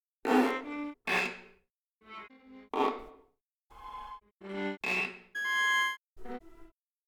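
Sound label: viola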